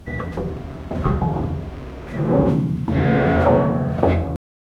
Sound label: clown walks on deck of